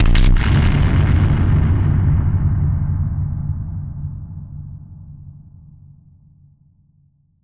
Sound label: Drum Bomb; musicradar; bomb factory; Drum Bombs